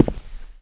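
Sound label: walk right heavy, dirt foot; The Elder Scrolls III - Morrowind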